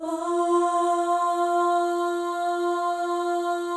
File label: OH, FEM, Symphony of Voices; Spectrasonics